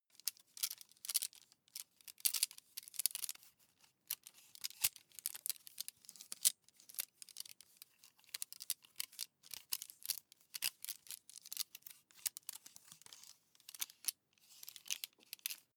meter handle

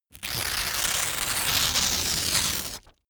sheet clean tear up slow

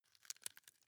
wood chip small break creak soft

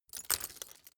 glass debris fall single light

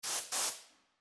compressed air

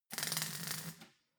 weld sizzle

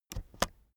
keyboard external space press slow soft

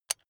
electric button drill direction switch lock heavy

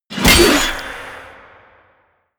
sickle hit whoosh bloody

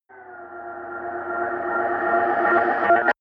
rise engine incoming

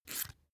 match light fail

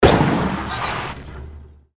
cars colliding

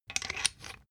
thread screw remove

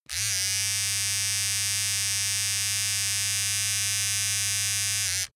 razor small long

